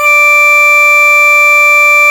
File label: FARFISA ORGAN, Vintage Timetraveler; Sonic Reality